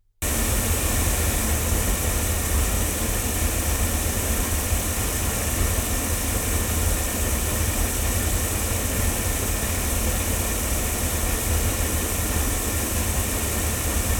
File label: omni loudspeakers; studio projects